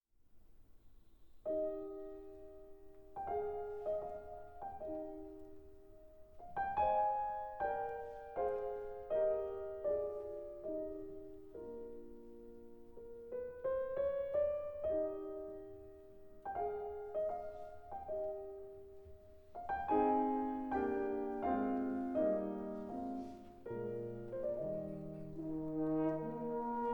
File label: Piano Concerto No 27 Larghetto; Mozart